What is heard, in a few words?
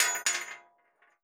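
bullet case bounce 50bmg metal; gmod; viper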